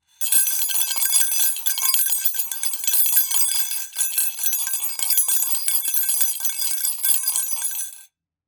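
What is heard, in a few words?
sound spoon in glass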